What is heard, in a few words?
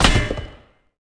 sword